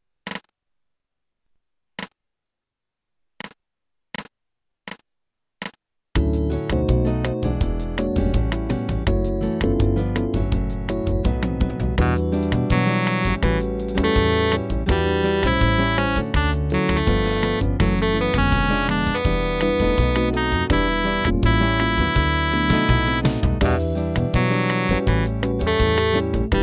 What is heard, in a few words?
Jazz